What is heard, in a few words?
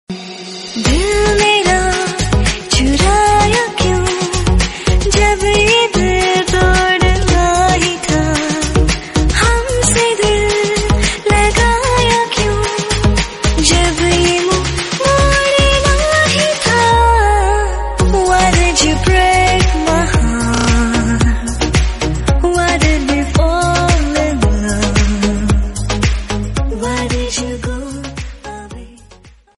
FEMALE VERSION RINGTONE